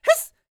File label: YELP, World Voices, ANDES FX